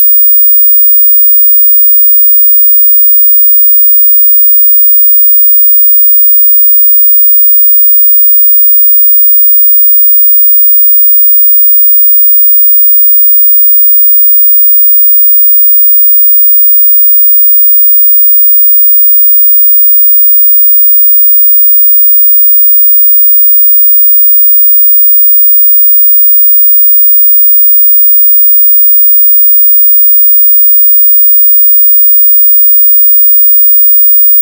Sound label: sine 15k